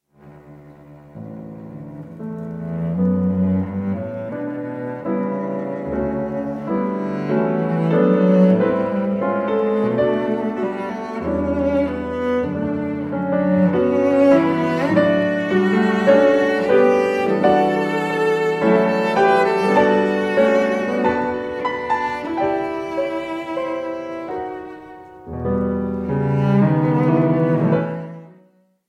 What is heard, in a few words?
piano trio